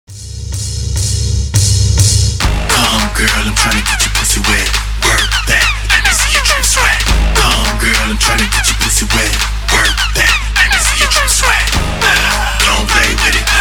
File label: moombahton remix; radio edit